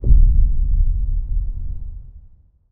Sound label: Explosion Deep